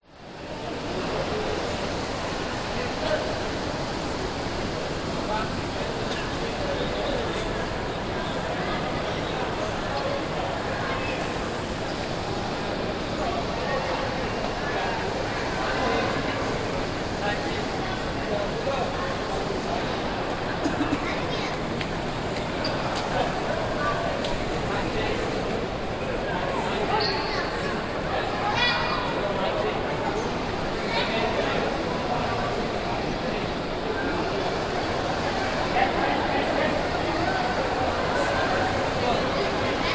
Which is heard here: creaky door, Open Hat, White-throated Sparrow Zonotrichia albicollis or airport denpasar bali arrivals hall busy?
airport denpasar bali arrivals hall busy